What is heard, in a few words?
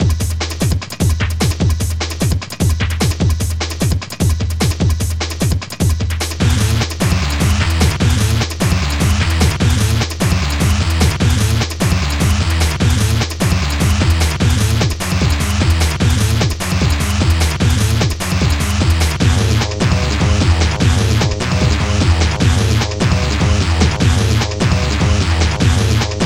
drum tracker; OctaMED SoundStudio V1; mug-members